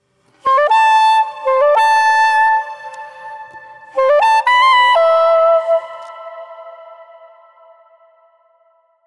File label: Sax Solo Amba; духовые